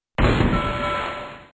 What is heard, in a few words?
bomb; MineSeeker